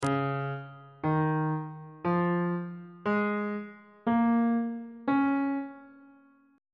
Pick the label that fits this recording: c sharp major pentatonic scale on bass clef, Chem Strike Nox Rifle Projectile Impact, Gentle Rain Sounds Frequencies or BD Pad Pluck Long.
c sharp major pentatonic scale on bass clef